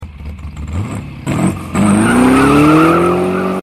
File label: Sports Bike